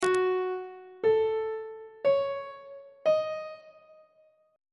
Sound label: g flat minor 6th chord intervals on treble clef